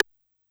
C#2 Analog Side Stick, Yamaha PSR-E323

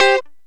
Lounge Guitar Chick Major C3; Zero-G Kocktail Kollection

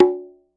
New Conga Op, Roland BK-5 Percussion

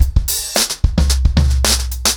110BPM, acoustic drums, drumloops

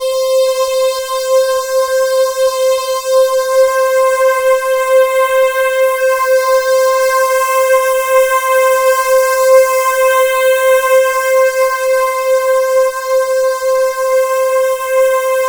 C4, Synth Line; 90 s Sample CDs; NiceBeats; SynthLine VOL-1

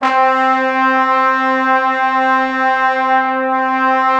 C3, Orchestral Brass; Best Service ProSamples vol.20; AKAI

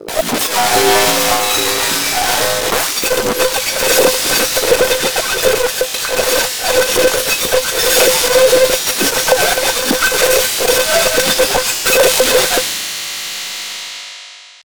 glitch

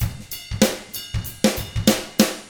95 FUNK, Drums; ILIO; Double Platinum Drums 1

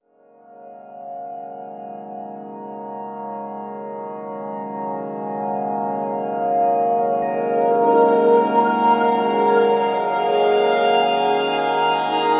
Long Atmosphere; Sonic Foundry (Sony Creative Software); Slow Silhouette

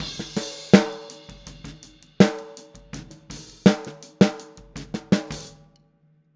Boogaloo Outro Snare, drums, 82 BPM

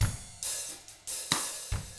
Double Platinum Drums, COUNTY

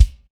BD, Hyper Dance

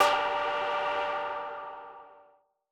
M Dynamic Tech G3; KG MegaSaur

